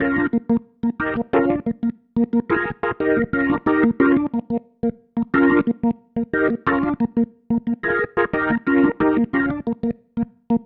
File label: Pattern, Organ